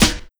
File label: Snare Jungle HipHop; pitchglitch